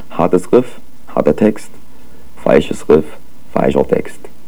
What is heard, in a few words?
riff